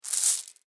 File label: Marbles Rattle Short; Many marbles being moved about, short rattling and clinking of marbles or small pebbles.; Miscellaneous Toy Sound Effects